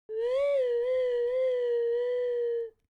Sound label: disgusted; pollen robotics; reachy mini emotions library